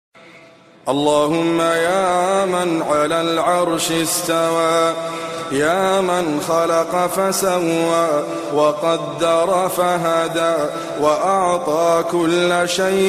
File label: Islamic ringtone